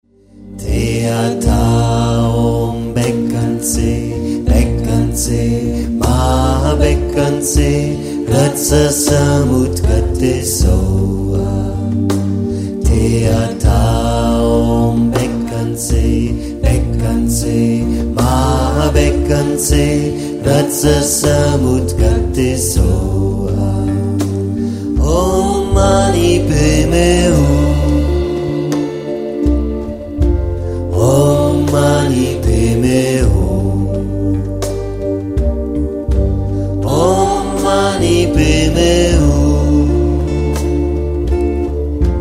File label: Medicine Buddha Mantra, singen; Medicine Buddha Mantra kurz; medizin buddha mantra singen koeln